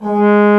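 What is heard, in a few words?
Trombone mf G#2; Digital Sound Factory Virtuoso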